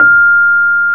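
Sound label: Piano Rhodes; Club-50 - Foundations Roland; FRhodes2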